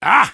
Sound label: Soldier taunt pain